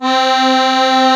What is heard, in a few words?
HOHNER, akkordeon